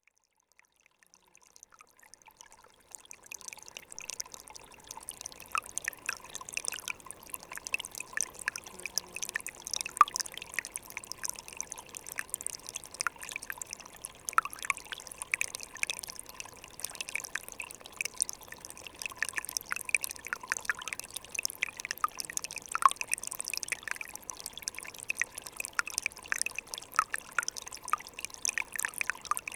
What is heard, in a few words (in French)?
Ruisselet; Ruisselet 1